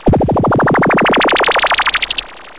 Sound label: lasergun